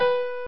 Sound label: piano; Picture Keyboard